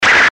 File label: fight kung fu swish